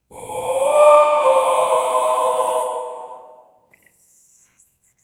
death sound

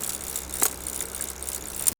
bike unbalanced drifting

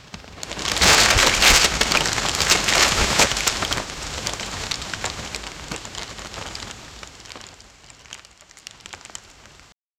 the roaring cacophony of; document

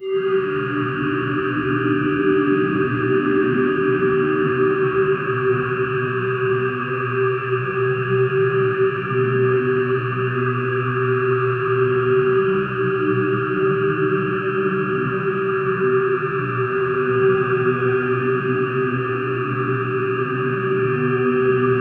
background sound, laboratory

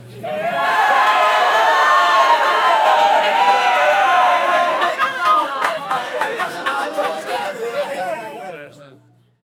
crowd cheering with laugh, pub

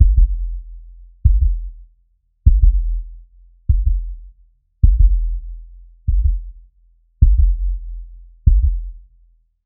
slow deep bass cinematic, rhythmic

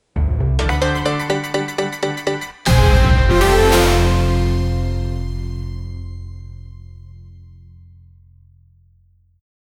dramatic intro, chess; chess game

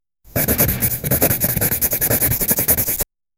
homework writing

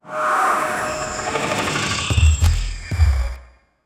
soft futuristic, fashion

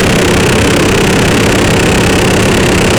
a looping rocket engine f

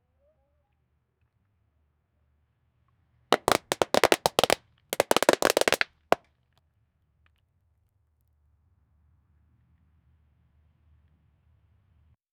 create a scene of a, surfing

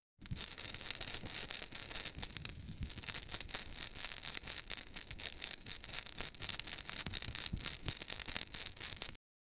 vocal

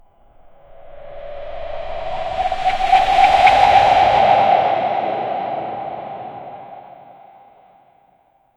echo whoosh trail fade

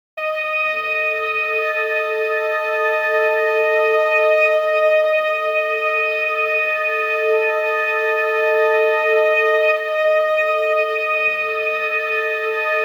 muffled organ notes in th, gothic

